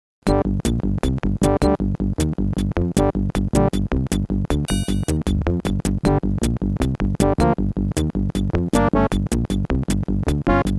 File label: adlib; silpheed going in fortress